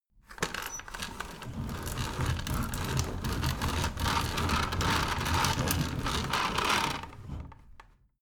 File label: window roller shutter open medium